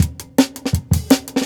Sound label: Jungle Breaks